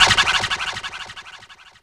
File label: SCRATCH, VINYL; Back In Time Records; Big Bang